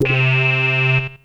Synth hits and riffs

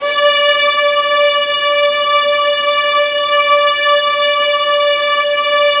VIOLINS E, Strings; ILIO; Synclavier Strings

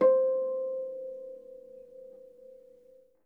H C5, Percussion & Harp; Miroslav Vitous

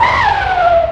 Skid; Classic SFX; musicradar; cartoon caper

